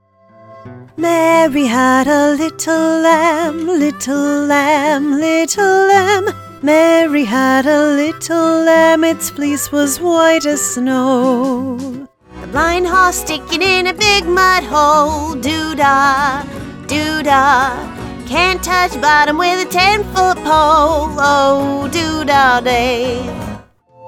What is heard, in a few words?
Singing